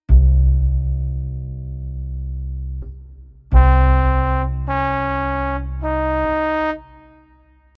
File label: C Db Eb C Drone; trichord ear training; 3 note combination singing exercises